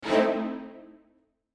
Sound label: middle strings chord